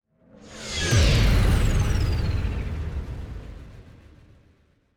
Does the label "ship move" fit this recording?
Yes